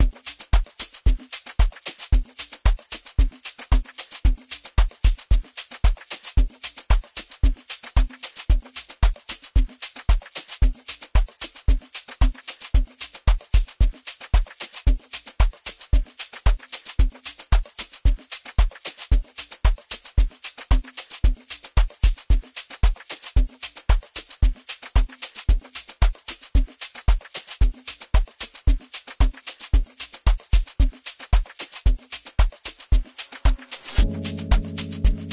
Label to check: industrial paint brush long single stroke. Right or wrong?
Wrong